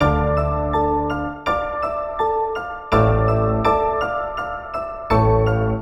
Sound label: Piano Trap Piano